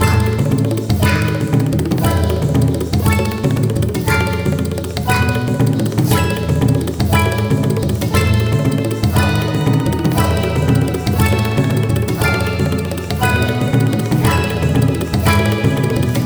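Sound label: respin ambience; netent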